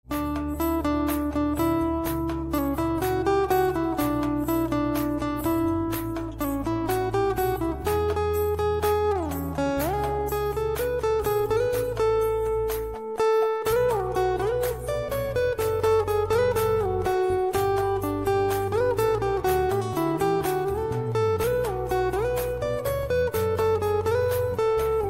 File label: Guitar